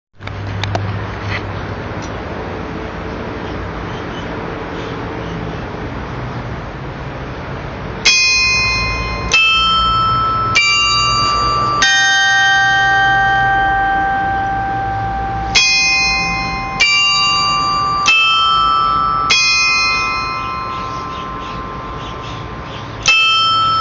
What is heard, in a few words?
carillon